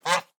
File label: pet goose